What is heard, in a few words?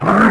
Bear Hurt Vox; Civ4 warlords